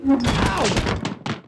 pick n click scene inn man on high stool

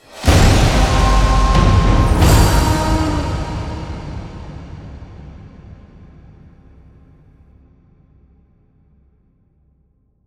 large Win; netent

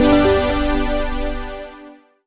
Win low Start; netent

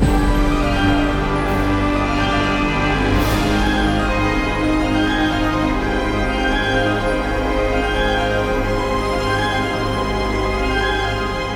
big win music; netent

